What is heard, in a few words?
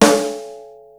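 snare rock rim, Yamaha Motif ES drum machine; 300 Drum Machines; Yamaha Motif ES-Series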